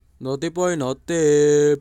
Ringtone

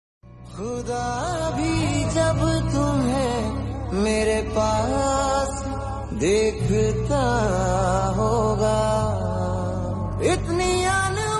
Romantic Hindi

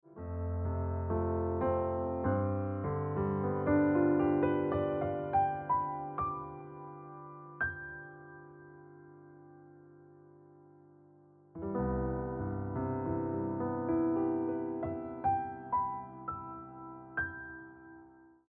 Piano Music

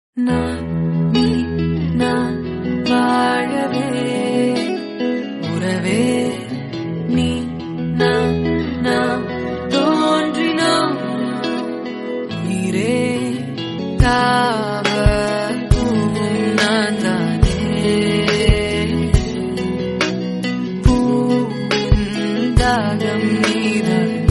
Love Songs Tamil

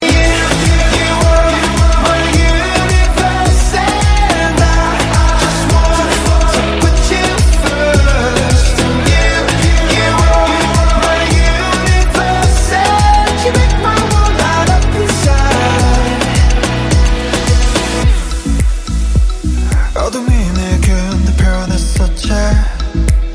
Remix Korean Song

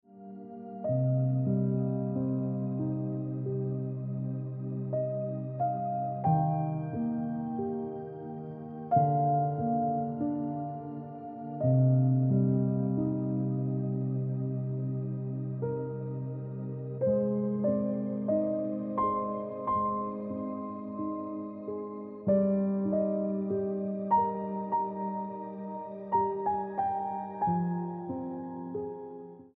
Piano Music

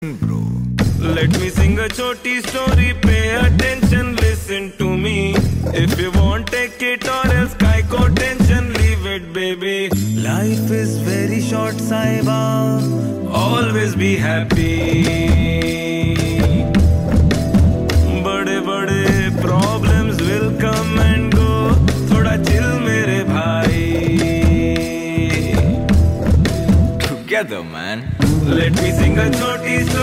Ringtone

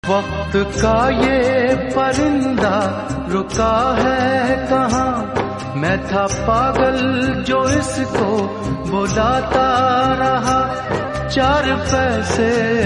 Sad Hindi

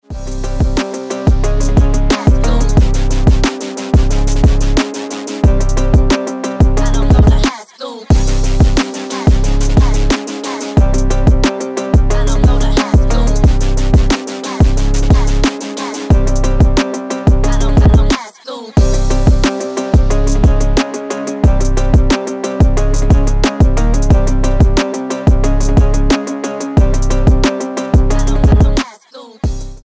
Dombyra Ringtone

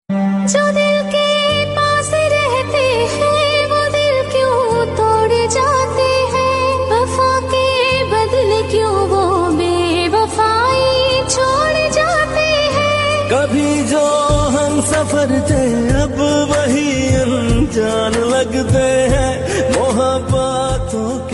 Sad Hindi

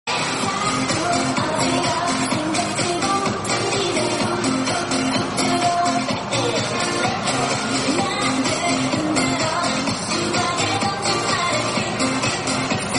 Chinese Ringtone

